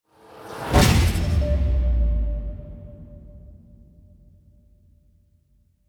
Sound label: Music Elec Trans Race end sweetener; Forza Motorsport 6 Apex